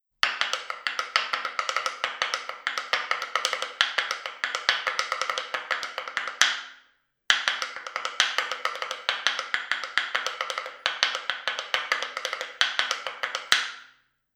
morteiro, instrumentos galegos

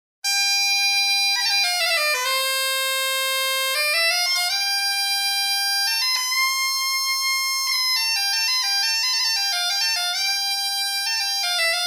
punteiro

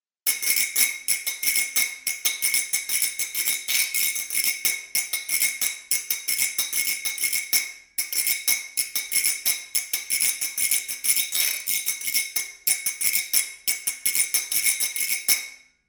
botella

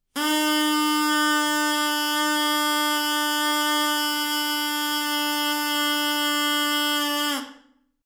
pallon, instrumentos galegos

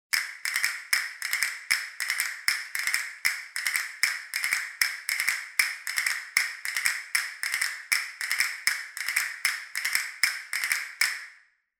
castanholas

